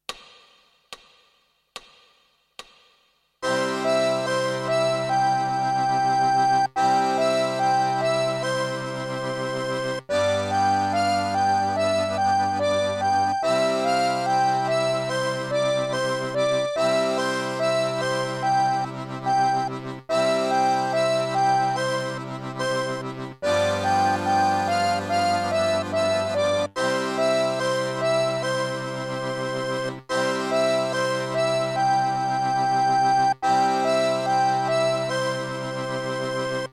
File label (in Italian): Contrabbasso, Fisa